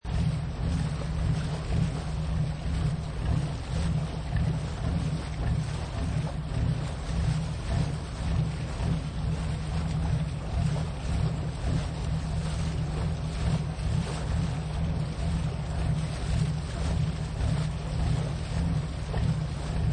River Boat Idling, Slowly pumping boat engine washing out water; Boats